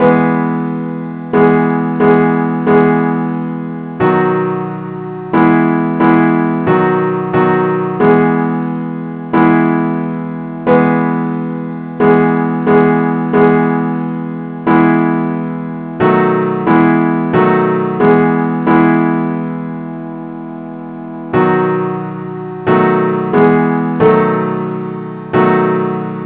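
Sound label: simple accompaniment key E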